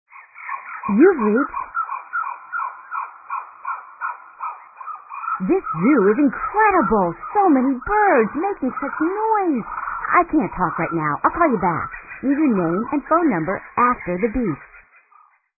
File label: Birds in a Zoo